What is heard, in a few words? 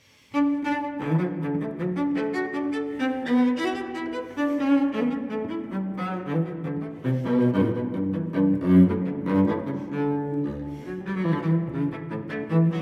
Cello Suite No. 6 in D major Courante; BWV1012; Johann Sebastian Bach